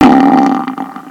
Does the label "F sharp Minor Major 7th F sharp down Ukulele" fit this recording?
No